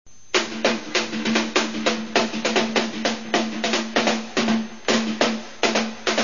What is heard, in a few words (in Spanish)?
percus